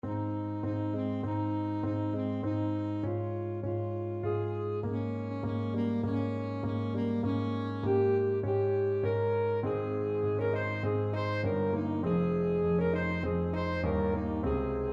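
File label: alto saxophone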